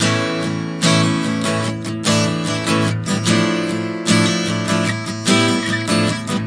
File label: 074 acoustic guitar strummy; GUITAR LOOPS